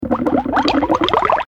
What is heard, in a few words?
Bubble; F.A.M.P.S